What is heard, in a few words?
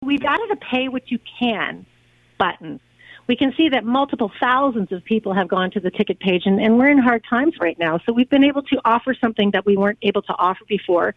south coast jazz